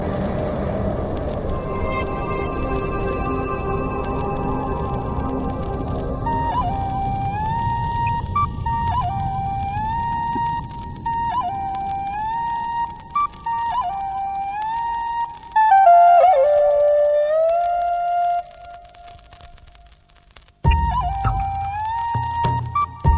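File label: Native American Flute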